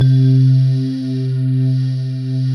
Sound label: SYN, Wavestation; Sound & Vision - Gigapack I CD 2